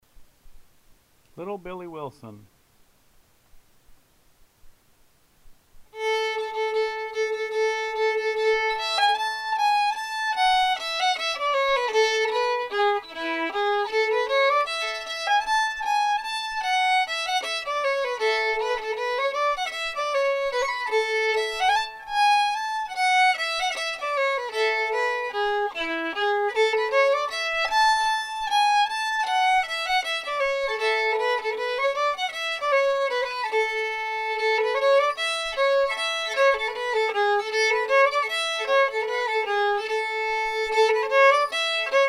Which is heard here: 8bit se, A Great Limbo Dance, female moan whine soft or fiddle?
fiddle